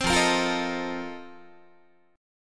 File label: F 11th F, down, Guitar; F11 strummed